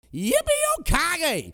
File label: PEOPLE